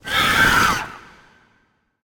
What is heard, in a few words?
phoenix death; smite; npc